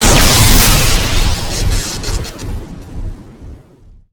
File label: Explo Energy Fireball; Destruction